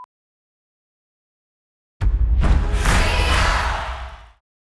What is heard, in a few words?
cheer ringtone; stadia